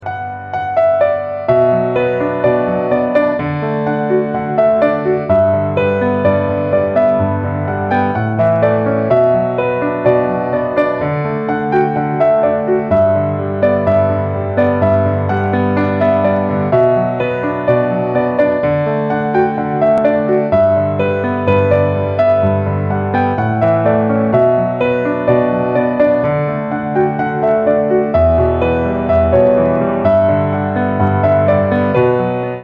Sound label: PIANO RINGTONE